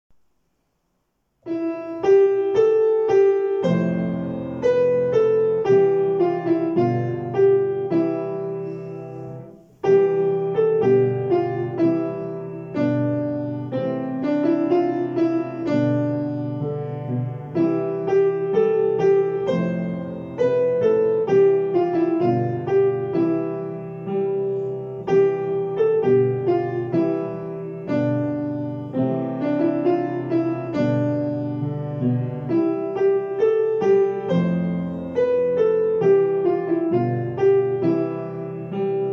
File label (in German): Klavier